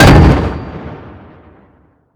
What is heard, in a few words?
Vehicle Weapons